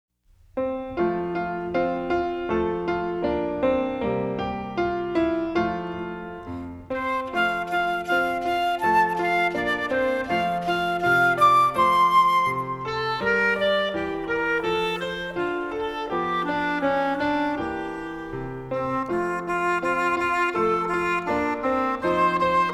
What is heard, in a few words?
music only